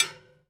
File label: Tube Hit With Metal Object